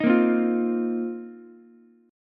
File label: A sharp Suspended 2nd Suspended 4th, Ukulele; strummed; JGuitar